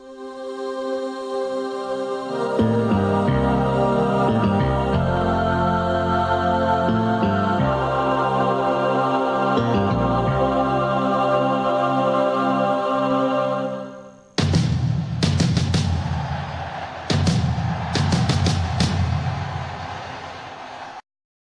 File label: Jingle Sport; Premiere Allemagne